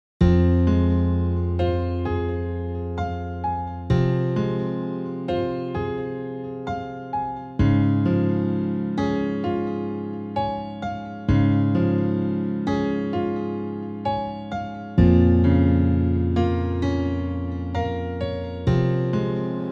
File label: Female Unplugged Version Karaoke 65BPM